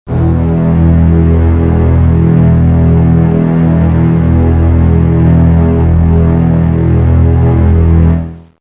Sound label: bass E; tuneguide